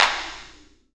clap, hip; Best Service; Dance Mega Drums

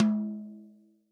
TOM, Real Drum Kit, POP KIT

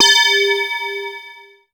BELL-GONG, A#; Big Fish Audio

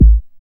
BD ANALOG; Sound & Vision - Gigapack II CD 2

